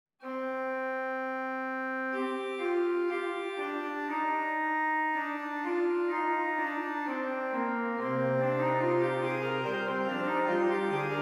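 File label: preludio, organist; wir glauben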